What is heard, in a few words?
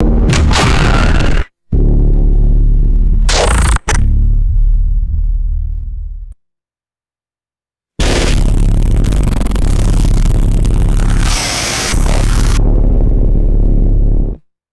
cinematic bass booms with digital glitches; designed